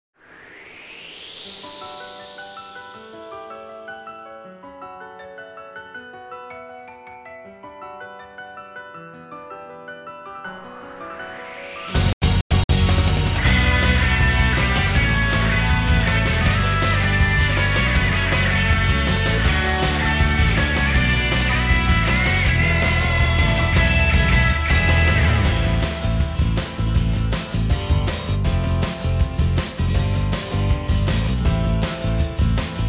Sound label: off vocal